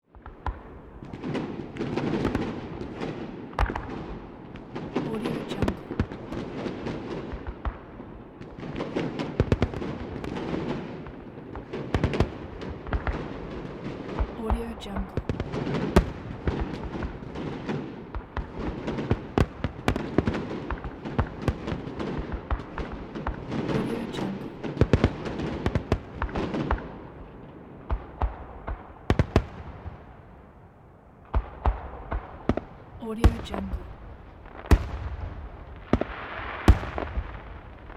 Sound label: fireworks